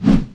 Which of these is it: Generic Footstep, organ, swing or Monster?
swing